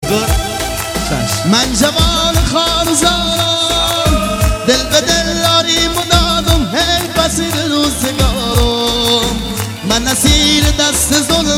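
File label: Kurmanji local music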